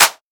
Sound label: Clap